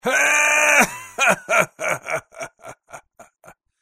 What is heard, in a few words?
risa pirata prate laugh